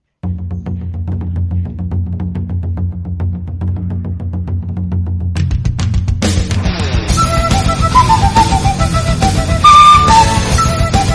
flauta; Naruto

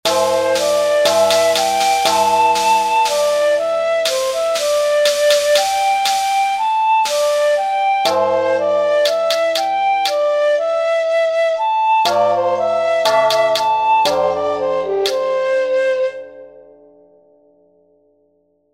Música orientaaal; oniichaaan

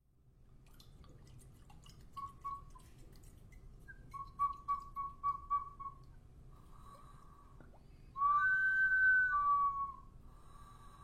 Botella Silbato de agua, Cultura Chorrera Ecuador; Galería de Sonidos Prehi

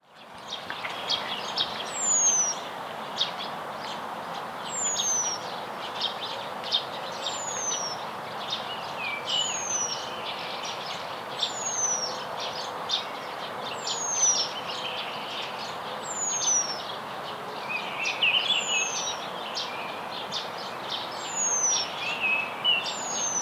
Pájaro moscón europeo Remiz pendulinus

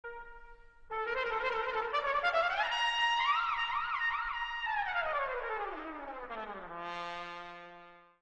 SONIDO DE TROMPETA

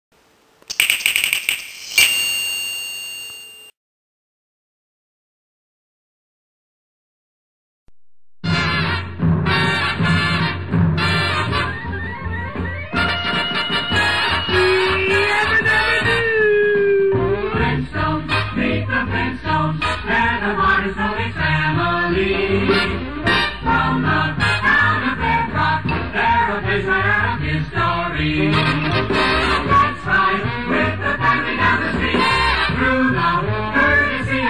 la canción; Los picapiedra

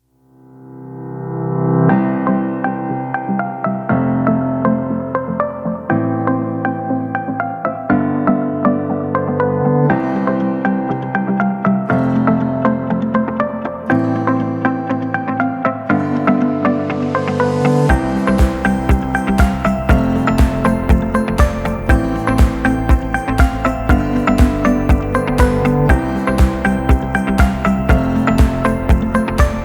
Música De Fondo Para Videos y Presentaciones

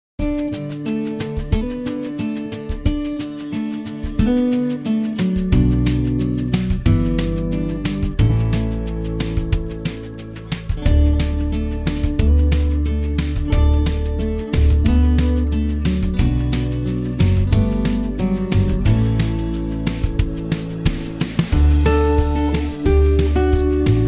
MUSICA VIDEO

